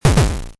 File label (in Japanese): dosun